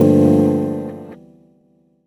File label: Chords Tones; Equipped Music; Loungin House